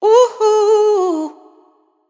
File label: Woo Hoo wet, adlib; Slate Digital Omnivox Vocal Sample Pack; Adlibs